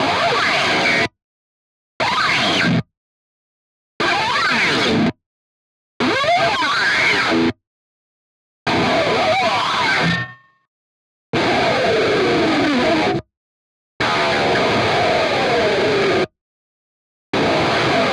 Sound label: Pick Scrape Down, Short, Overdriven; Rogue Waves; Metal Tensions